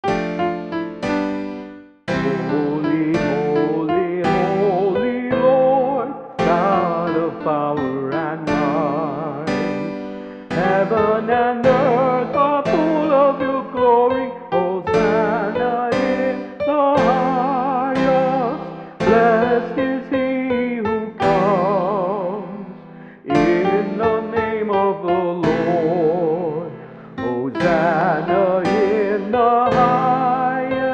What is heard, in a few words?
Sanctus vocal